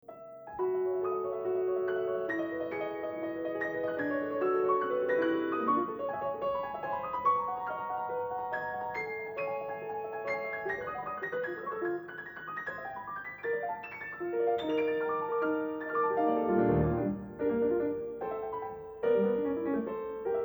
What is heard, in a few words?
Piano Sonata No 9 Mvt II; Vincent Persichetti